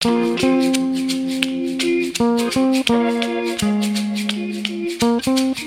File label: Old Song Sample